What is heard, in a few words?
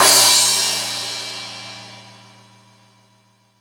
Hip Hop, Drum Machines